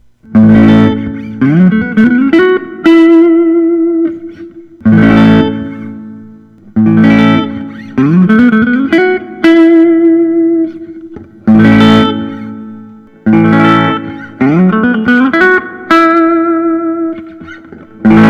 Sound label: Paul Reed Smith Modern Eagle I; faded blue